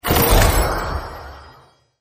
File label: open World Tree Box